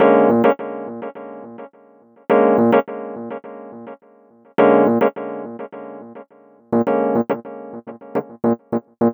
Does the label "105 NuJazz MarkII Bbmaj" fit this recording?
Yes